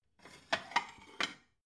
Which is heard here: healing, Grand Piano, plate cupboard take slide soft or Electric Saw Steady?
plate cupboard take slide soft